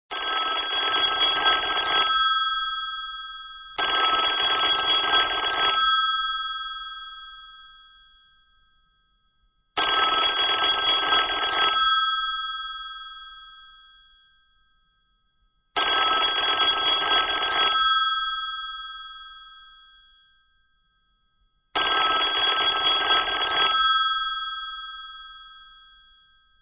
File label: old bell